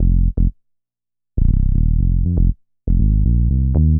BASS, DANCE; MAGIX

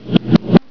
SWISH

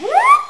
CLAXON; TAIL

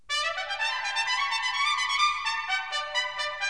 FANFARE

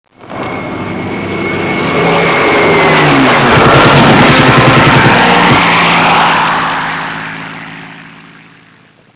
strafe; warbirds

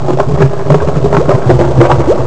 Water; MarkoSuominen; GraveYard

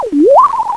animal

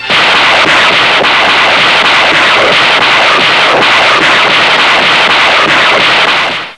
rockets; fx; warbirds

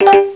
HIT WALL

